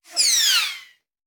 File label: Bullet Pass By; Sound Ideas; Guns